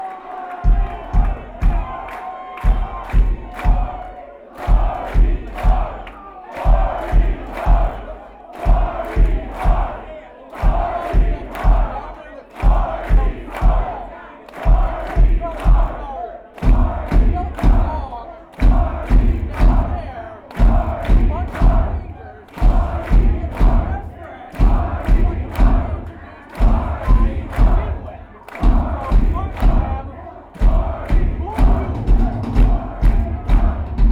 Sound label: Drum Solo (Live)